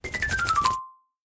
tile disappear

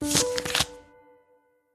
wood tile flip

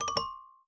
block chip fail

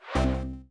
game show quiz bonus move

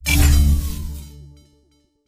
space chip fail

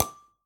block tile wheel

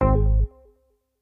chip fail

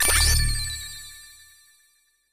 space chip minor

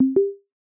menu subtle

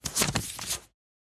wood tile flip back

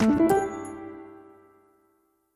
wood chip minor

